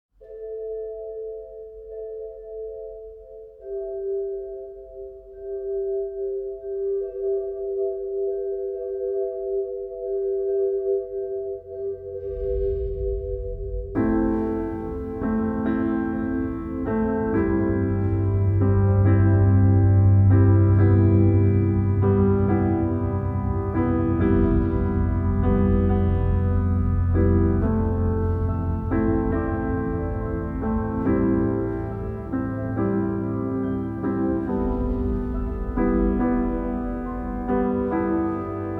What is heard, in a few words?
Epic Trailers